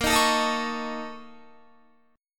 A sharp Minor Major 7th Double Flat 5th A sharp, Guitar; A#mM7bb5 strummed; 18,18,19,20,21,20